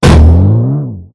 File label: jump on monster